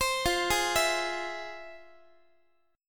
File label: F Minor Major 7th, Guitar, chord; FmM7 arpeggiated; JGuitar